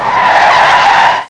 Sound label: GOOD car key